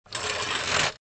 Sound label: STEP